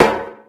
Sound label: pipe land; Golden Crayon Sounds